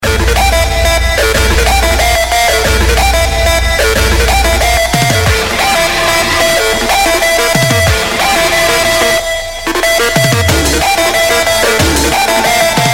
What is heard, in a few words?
techno trend loud; rock